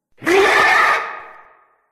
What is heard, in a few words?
pain grunt